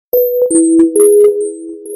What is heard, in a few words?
Msg Tone